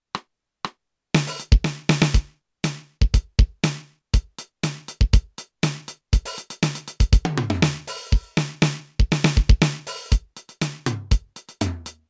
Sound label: 16beat